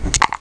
DICE UP

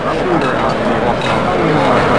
crowd

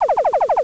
electron, electronic sounds; Sound Effects for Multimedia - Volume 1

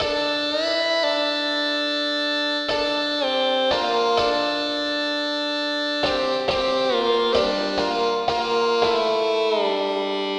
guitar

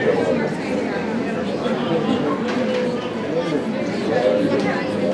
Ambi, indoor; Atennis

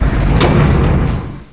chain plat; Hexen2; plats

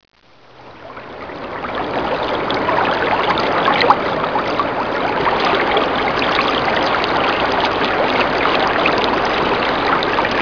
brook

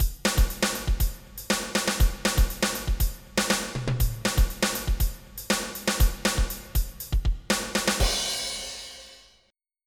FUNK; GADDFUNK

